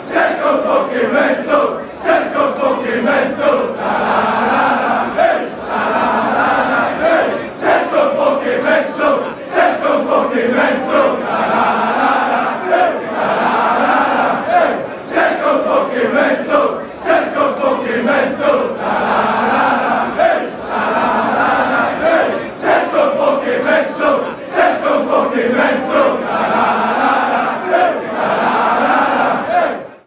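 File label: player chant, football fan chants; sesko; manchester united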